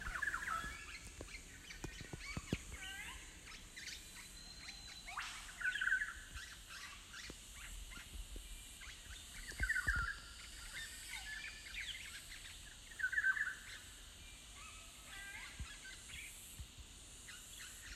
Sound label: lower beechmont conservation area